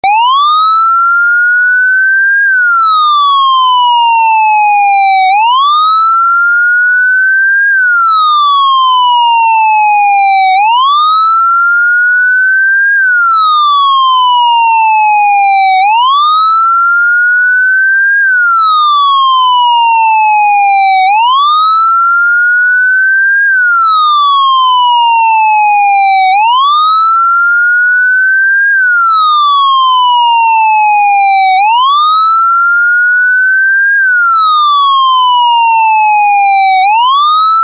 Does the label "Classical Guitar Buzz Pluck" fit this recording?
No